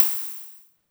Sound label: polyvox hat, Drum Machines, Formanta Radio Plant Polivoks